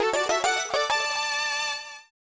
Jetpack Fanfare; go go ackman

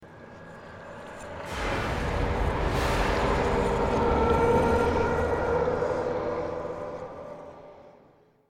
Stinger Swell Long

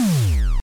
Slots Finish

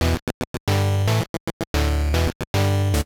Victory; WWF WrestleMania Challenge; NES

